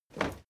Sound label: step crowd run quiet single left; schritte